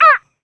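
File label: tropical bird; Counter Strike Model Pack; CS:GO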